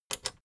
plastic button small latch click enable fast

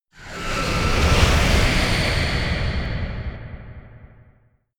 squeaking reverb

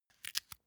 match put back

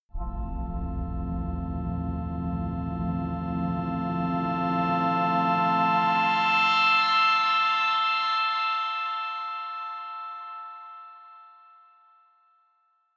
organ swell major

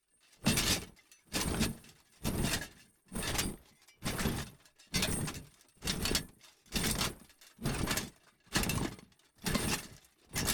troops metal clatter march slow

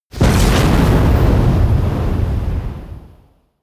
missile large fire stationary close

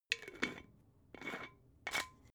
glass bottle massive close extra slow

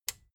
plastic button small latch release

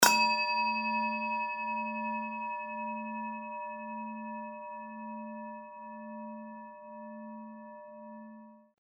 japan rin bowl crash, D

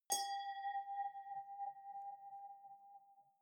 wine glass hit ring fluctuate

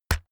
keyboard external key hit push

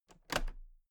toolbox latches open regular

